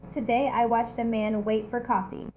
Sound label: beat